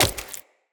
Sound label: Wall Jump Flesh; Tenno; Footsteps; Wall Run